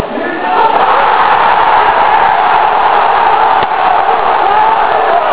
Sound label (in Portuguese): gritos gol; portuguesa 0x2 nautico